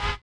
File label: collision; Spectrasonics; Metamorphosis